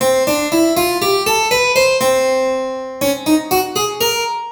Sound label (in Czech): klavesnice